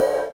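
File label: crash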